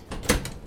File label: open close, doors